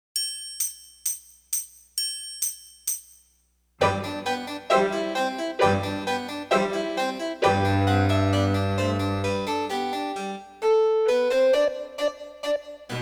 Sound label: French Dance